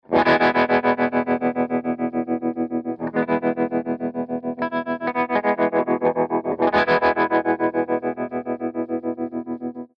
AC30 TREMOLO P90

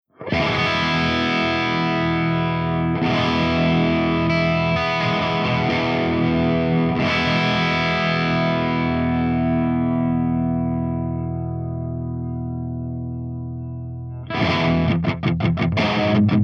ENGL SOV CH3 DRIVE V30 HB

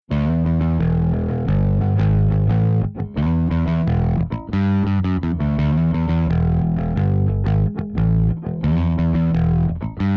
PSA1 STANDARD

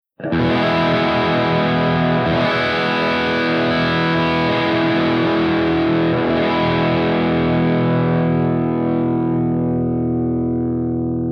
ROCKERVERB CH2DRIVE V30 SC

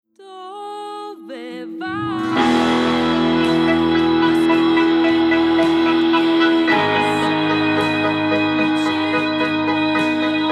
Hammond Pulse Drive

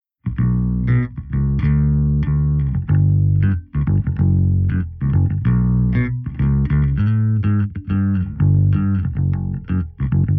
ASH VALVE DRIVE

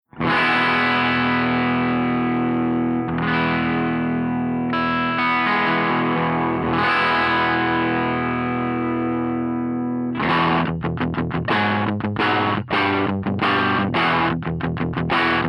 FENDER75 DRIVE CHANNEL P90

